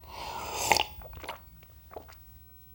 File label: drink sip gulp single swallow; هورت کشیدن و قورت داد; human sounds